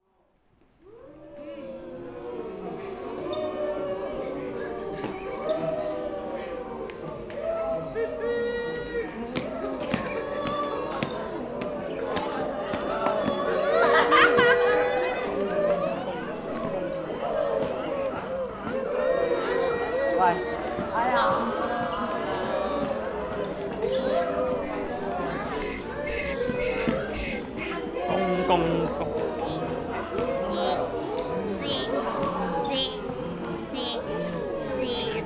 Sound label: soundscape; shek wu sch